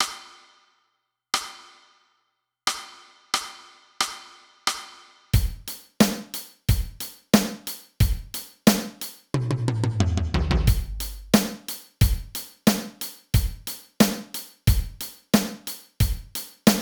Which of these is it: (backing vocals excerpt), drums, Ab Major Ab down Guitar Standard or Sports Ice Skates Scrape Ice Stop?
drums